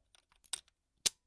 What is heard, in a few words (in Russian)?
zvuk ustanovki shtativnoj plastini